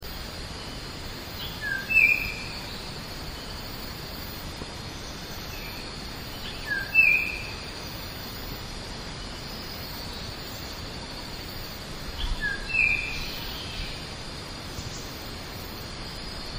bornean oriole; PAYAMAGA

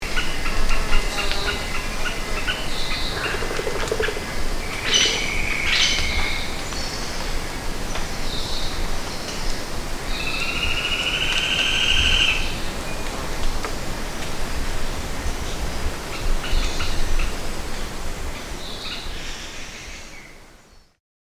orange-backed woodpecker

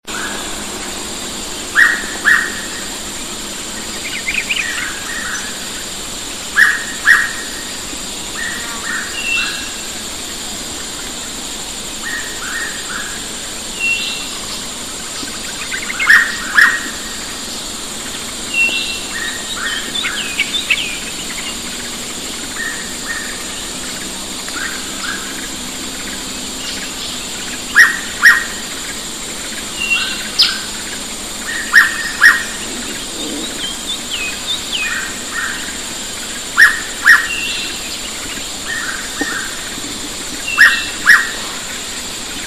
hooded pitta

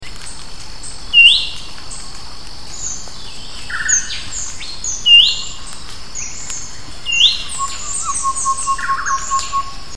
ferruginous babbler

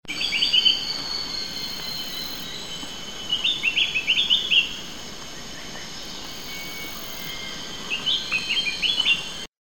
spectacled bulbul

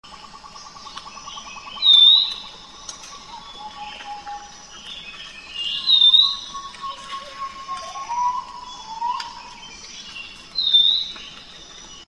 black-capped babbler

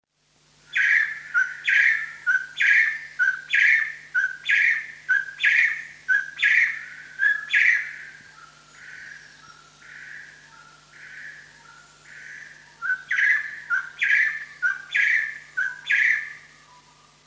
crimson-headed partridge

